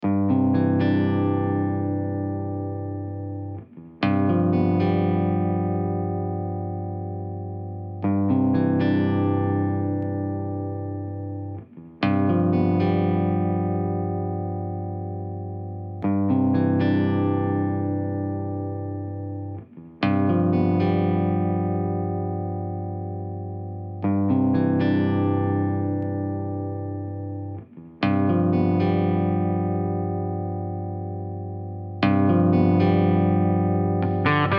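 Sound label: G 120 guitar arpeggio riff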